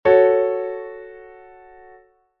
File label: F♯m, Chord Inversions